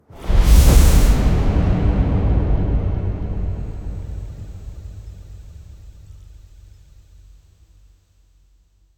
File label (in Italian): trasformazione di una kit, energy explosion